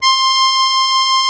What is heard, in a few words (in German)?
AKK VIOLI, akkordeon; Best Service; Alpine Volksmusik